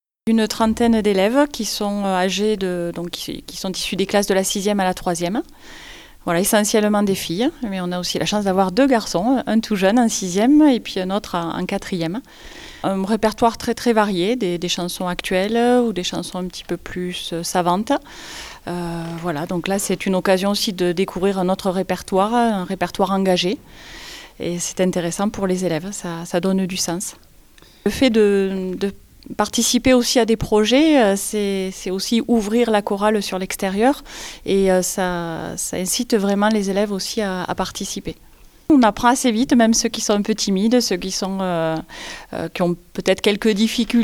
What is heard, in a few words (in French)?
chorale